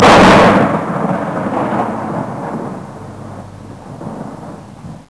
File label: thunder; quake2; action; world